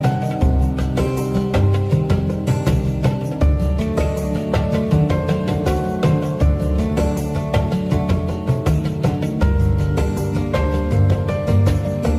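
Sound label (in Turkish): bedava türk üzgün ringtone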